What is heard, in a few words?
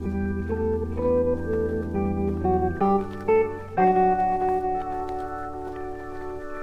keys church organ, Sad; Loops